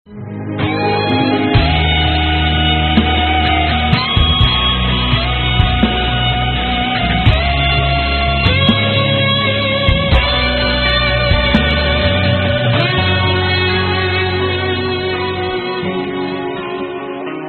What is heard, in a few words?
guitar piece; ringtones